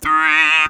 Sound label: Talk Box high